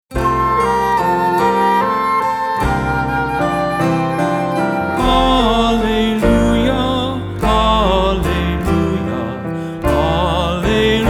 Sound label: Gospel Acclamation